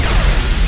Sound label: Cannon Charge Full; Magna